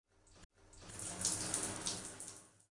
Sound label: dino shower